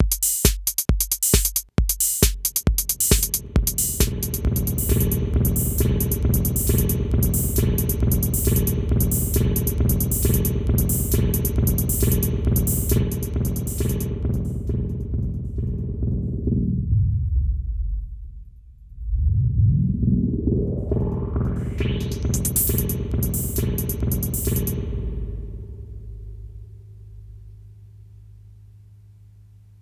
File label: 808 rev